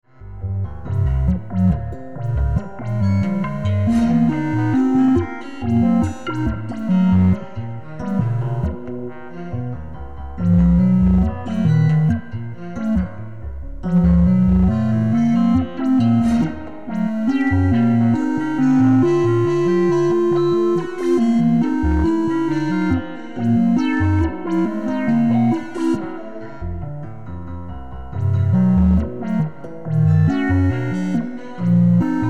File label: Sequencer; Klee